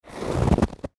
Footsteps Snowshoes Snow Single Step Shoes Impact Crunch; Foley